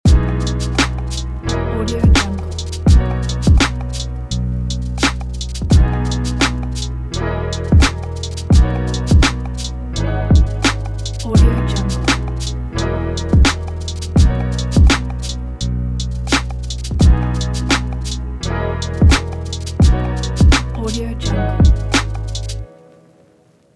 lofi ident

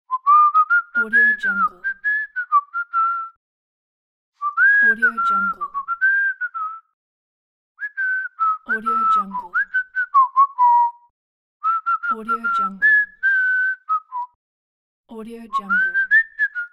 happy whistling

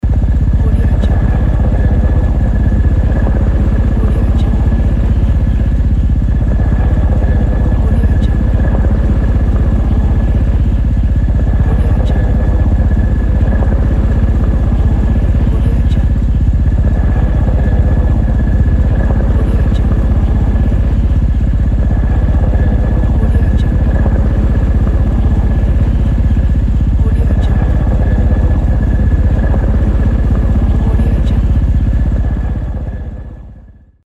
helicopter

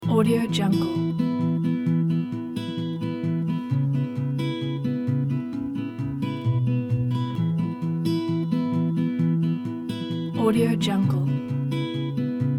acoustic inspiring folk

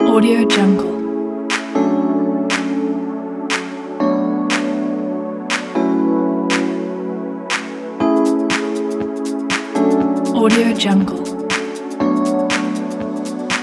luxury fashion funk soul

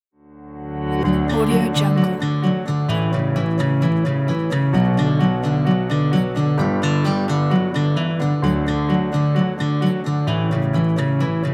acoustic indie folk upbeat kit

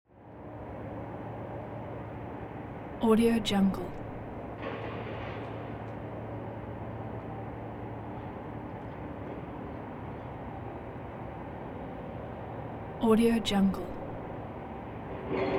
atmo urban suburb nightfall construction site

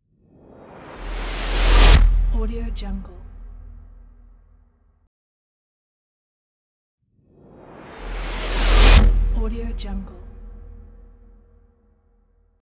transition impact; sfx-laboratory

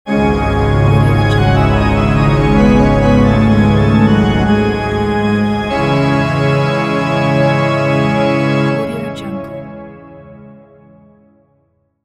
church organ logo; jvsouls

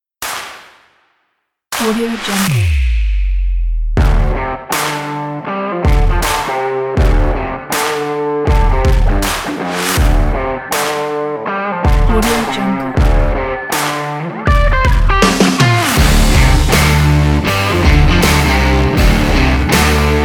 sport energy rock pack

